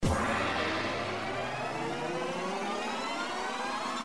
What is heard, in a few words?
SCATTER ANTICIPATION; Lucky Wok